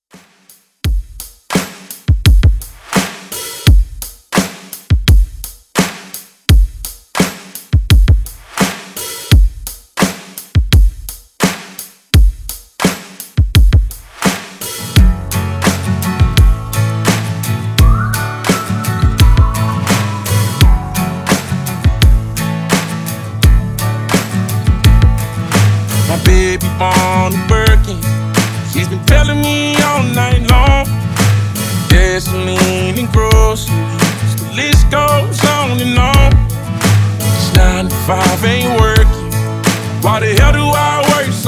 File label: Redrum